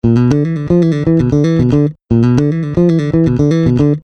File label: Boogie Intro Lick; suhr classic j 4 string